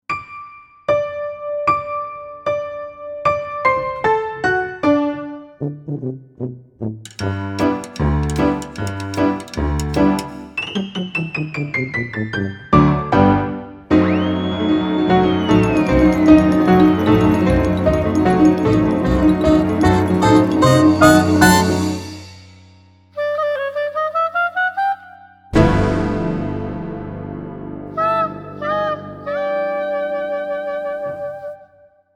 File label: slapstick